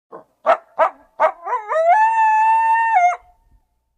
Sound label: Coyote Howl. Single Howl Following Two Short Yelps. Medium Perspective.